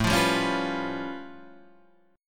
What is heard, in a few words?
A Augmented 9th A, Guitar Standard; strummed; JGuitar; 5,8,5,6,6,7